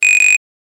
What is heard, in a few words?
Buzzer; Nokia; 6208 classic